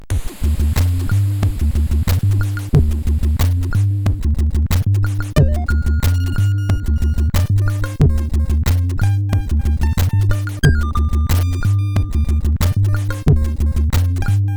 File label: sid CSG8580